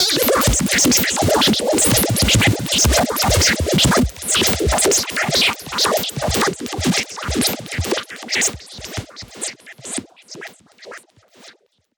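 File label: Modulation Proc, alarm siren samples, 120bpm; musicradar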